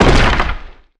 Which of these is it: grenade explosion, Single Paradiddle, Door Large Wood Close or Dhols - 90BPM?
Door Large Wood Close